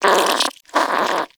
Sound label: Vocal Snore, Hunting Critters; Characters; Warframe; Nexifera